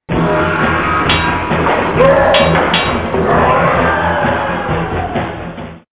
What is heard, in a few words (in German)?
klingelton